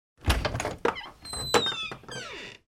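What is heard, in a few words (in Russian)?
двери с щеколдой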